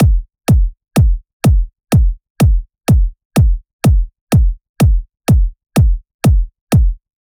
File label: Bass House Kit, 125 bpm, Kick; EDM Drums; Drum Kits; Stems; Ghosthack; Ultimate EDM Drums; Bass House Kit 10